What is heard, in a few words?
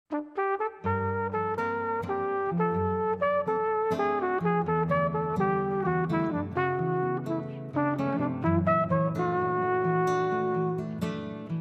BOSSA NOVA